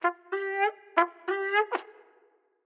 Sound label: Solo Trumpet in F 90bpm